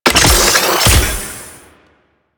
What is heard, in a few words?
Med Station Activate; Warframe; Lasrian; Lasrian Soldiers; Weapons